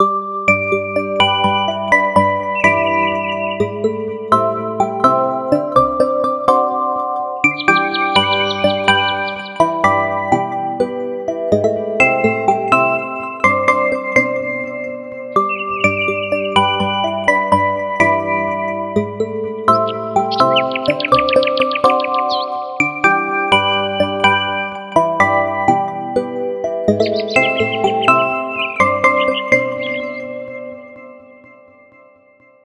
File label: Daydream, alarms; Mi Mix 2S Stock Ringtones